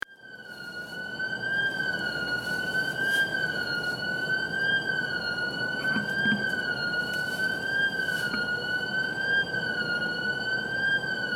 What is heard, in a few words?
alarm